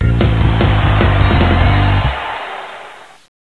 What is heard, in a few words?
Round Failed; Stunt Driver Online